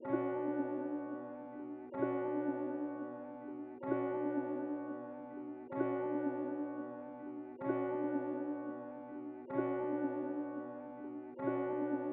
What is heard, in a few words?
127 BPM B Min Piano; Trap Melody Loops; Loop Stems; Cymatics; Spirits